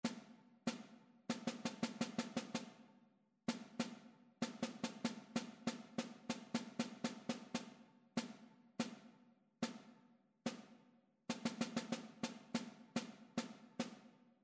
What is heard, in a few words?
rhythmic error detection; ear training